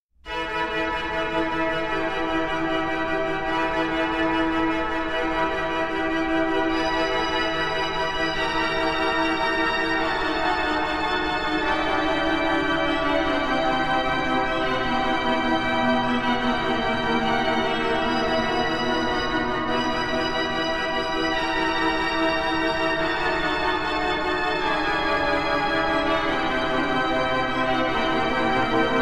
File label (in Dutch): Improvisatie Psalm; 65 De lofzang klimt uit Sions zalen